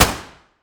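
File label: weapon dmr sup fire player atmo int; gmod; viper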